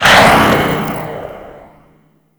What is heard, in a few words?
Tail; Warframe; Weapons; Corpus